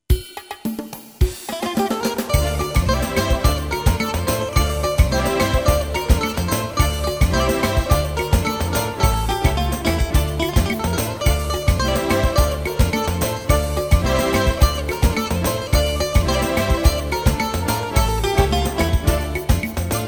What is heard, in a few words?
rumba; Griechisch